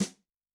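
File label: Brush Slap, Yamaha DTXpress-II snare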